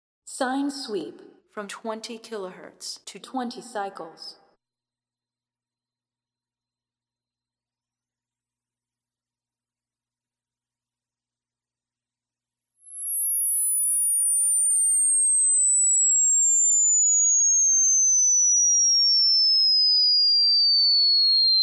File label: 20kHz a 20Hz